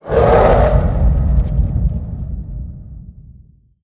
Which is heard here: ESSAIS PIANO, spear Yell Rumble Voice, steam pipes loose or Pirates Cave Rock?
spear Yell Rumble Voice